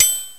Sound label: Metronome Bell; GMPlay; TG300B